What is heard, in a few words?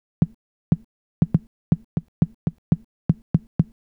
conga Denon; hydrogen